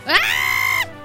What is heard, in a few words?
ahhhh